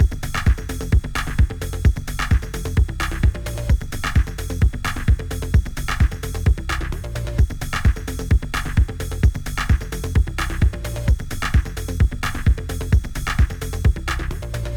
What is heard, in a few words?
Music Loop